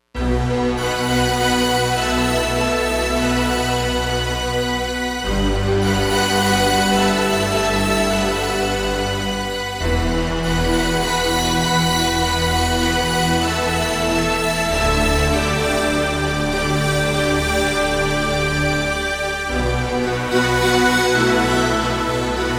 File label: Chinese Orch, synth